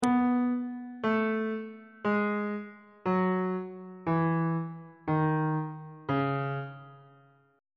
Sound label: d flat minor scale descending